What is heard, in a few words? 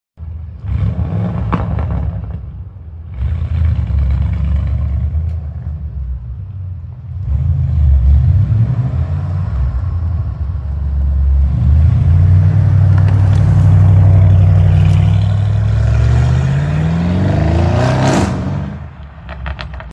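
Bentley, exhaust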